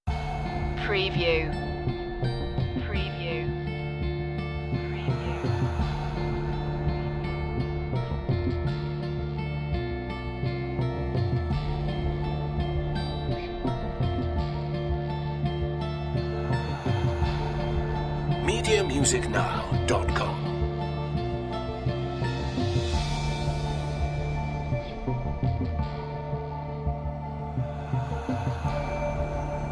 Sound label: coven no drums no choir